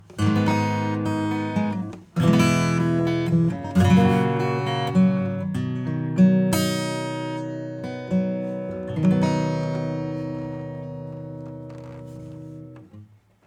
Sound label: acoustic bypass; FireMaximizer